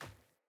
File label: Sandals Run Dirt, Footsteps; Player